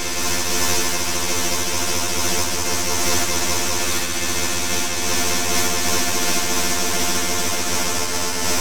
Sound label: sicky drone; goodnight jellybean; godot